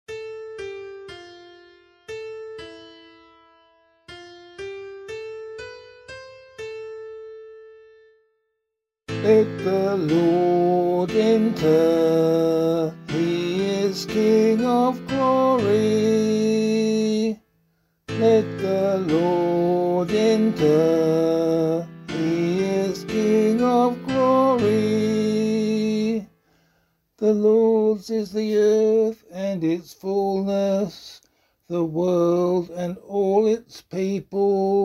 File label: Psalm vocal